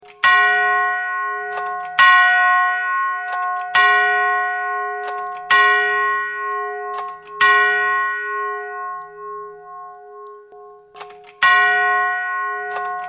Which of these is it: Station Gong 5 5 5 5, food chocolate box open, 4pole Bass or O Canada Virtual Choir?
Station Gong 5 5 5 5